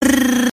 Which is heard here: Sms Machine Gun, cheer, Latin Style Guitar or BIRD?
Sms Machine Gun